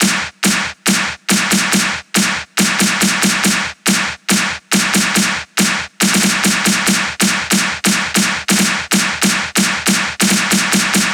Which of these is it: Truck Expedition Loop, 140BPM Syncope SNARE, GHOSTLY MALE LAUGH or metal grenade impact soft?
140BPM Syncope SNARE